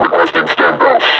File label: injured, suppressor